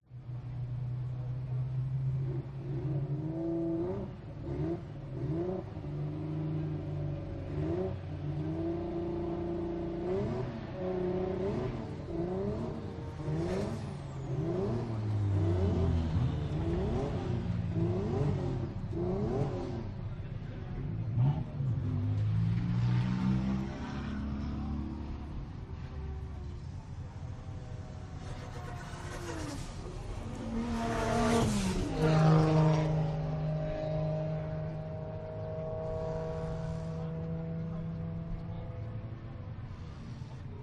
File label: pit lane ambience race car idling revving up impatiently background; passes at top; Sound Ex Machina